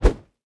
fists fire; Garrys Mod

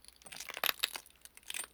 Walk On Broken Glass; Project Zomboid

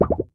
bloop die; Clicker Heroes

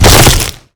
bonebreak; killmove; Garrys Mod

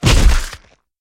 bloop death; Clicker Heroes

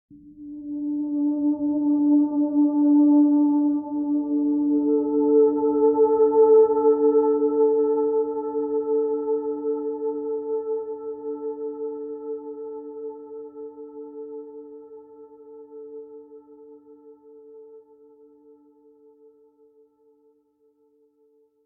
ambient gleba interlude; Factorio; space-age

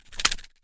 bug death; Clicker Heroes

